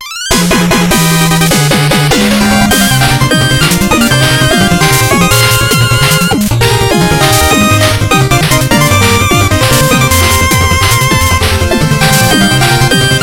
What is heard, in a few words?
Music Boss; KK Boss Giga Cardia; Shovel Knight King of Cards